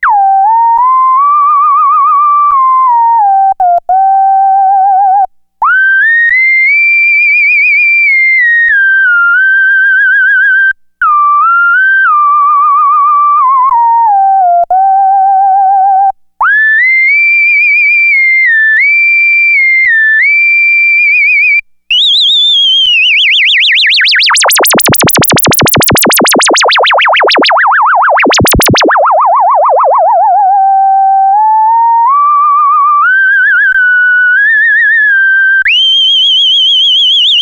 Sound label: MOOG WHISTLE, Moog Micromoog